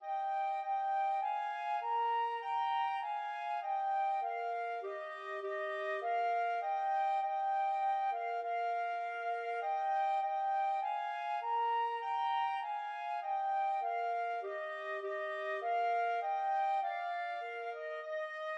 ode to joy flute clarinet duet

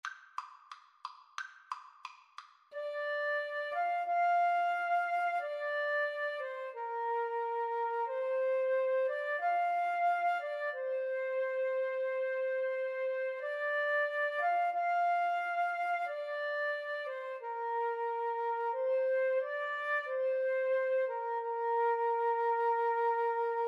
largo flute sax, Flute Saxophone Duet

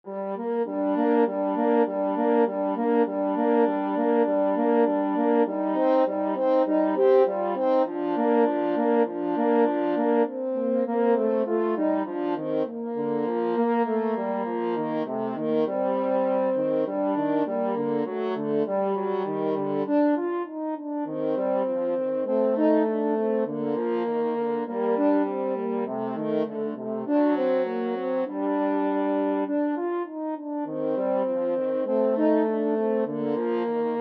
french horn duet

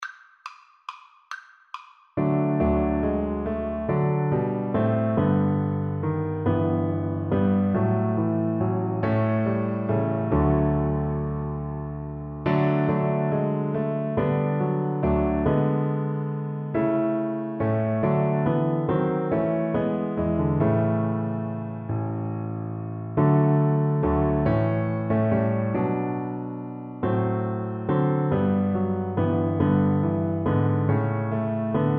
Double Bass

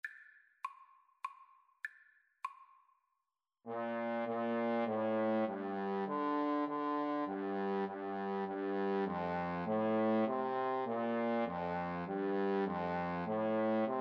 Trombone Duet

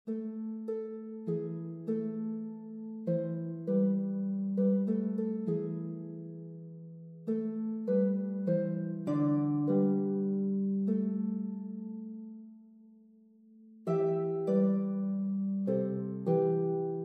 harp

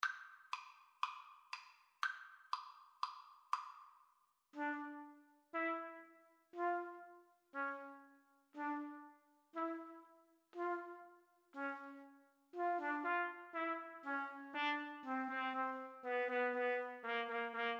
Trumpet Trombone Duet

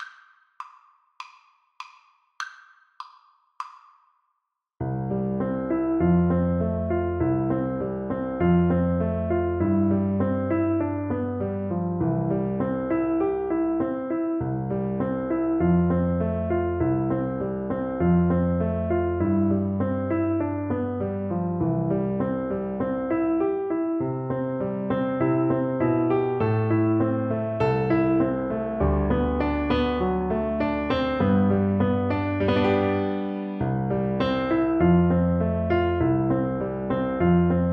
blue bells scotland FL kar, Flute

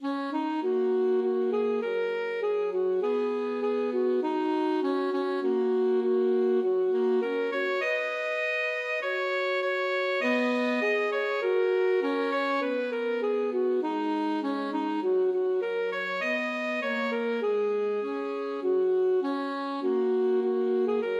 loch lomond alto saxophone duet

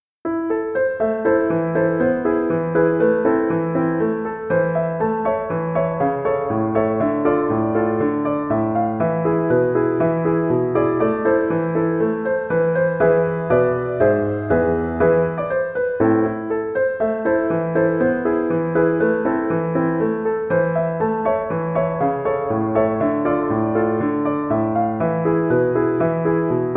Hevenu Shalom piano

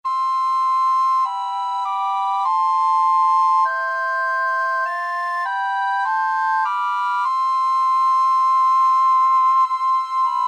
abide with me, recorder trio

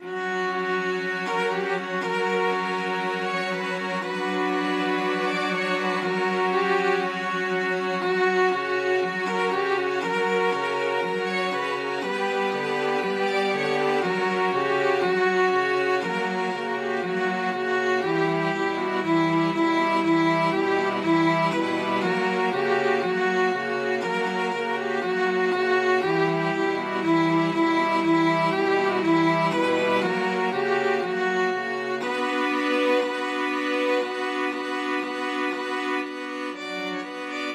STRQ; string quartet

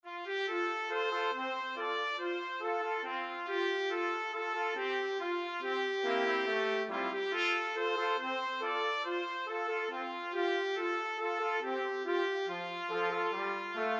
alabama trumpet quartet

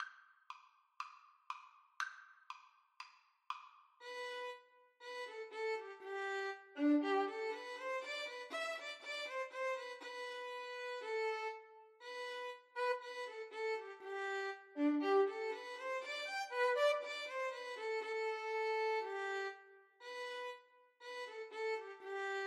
violin duet

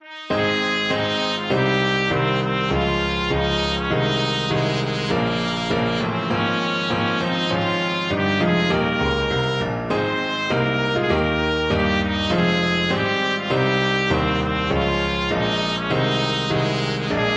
national anthem russia, trumpet

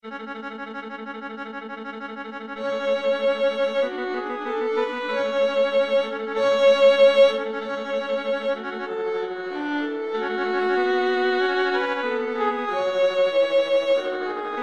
scene finale, violin viola duet

